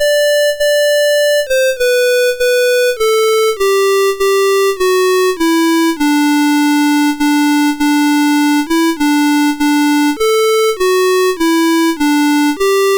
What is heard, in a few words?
Away in a Manger, MOS6581R3; Becky